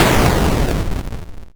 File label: explosion, Retro Sounds; Kenney's Sound Pack; Retro Sounds 2